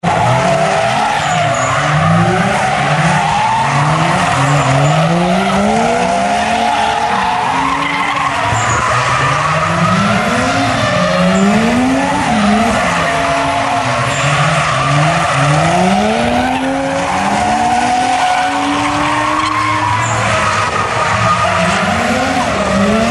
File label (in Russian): mashini driftuyut drift